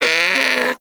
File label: Predator Vocal Pain; Warframe; Hunting Critters; Characters